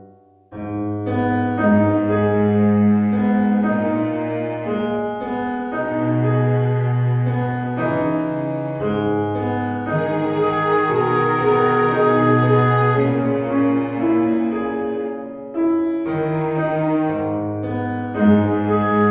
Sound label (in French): thematique mysterieux